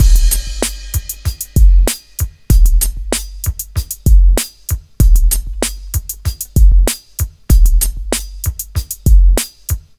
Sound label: Beat, club; Sonic Foundry (Sony Creative Software); Club Joints and Street Anthems; Toxic Rain